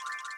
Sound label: reel spin; fruits